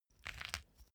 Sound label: small pepper grinder glass ringing soft; industrie-wirtschaft; gastronomie